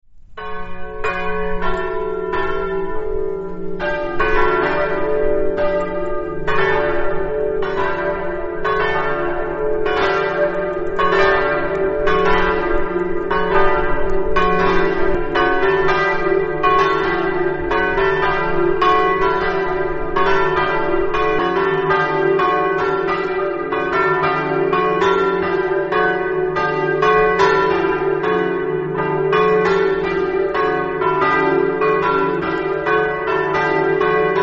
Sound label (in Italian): campane; sclaunicco